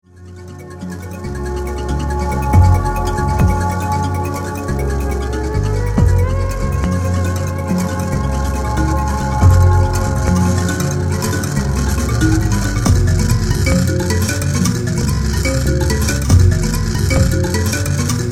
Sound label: mbira song